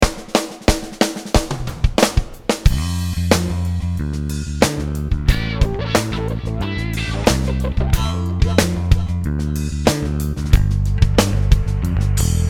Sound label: Backbeat 88 Push Pop